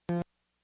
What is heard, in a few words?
Blip